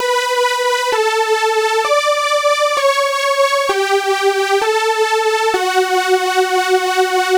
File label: Lead Original